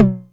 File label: Tom Hi, Novation Drumstation 909